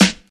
focused snare drum g sharp key, Royality free snare sound tuned to the G# note; snare drum one shots